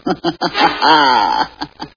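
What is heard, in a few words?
creepy laugh single female low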